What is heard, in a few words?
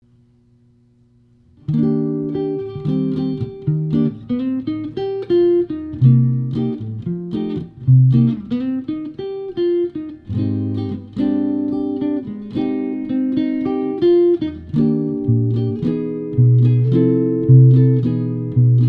Maple Syrup Electric, guitars